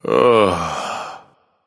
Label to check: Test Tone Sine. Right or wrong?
Wrong